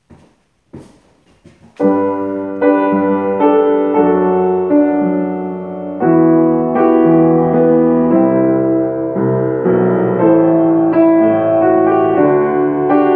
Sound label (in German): Klavier